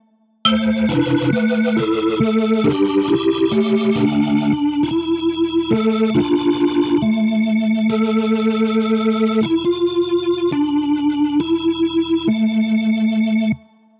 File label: synth loop, drum n bass